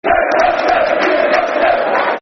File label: Football Chants; We Hate Nottingham; Derby County Dcfc